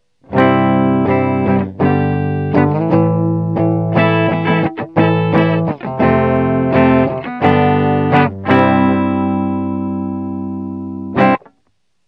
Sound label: clean